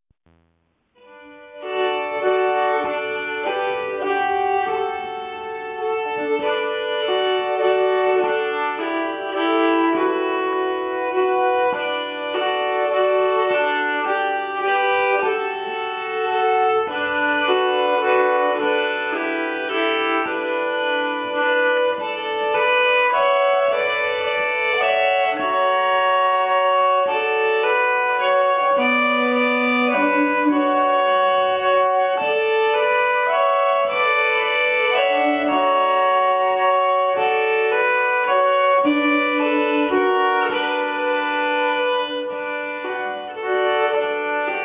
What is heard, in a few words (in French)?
valse